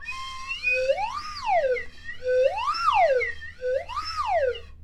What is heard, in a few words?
Bounce Up and Down, Slide Whistle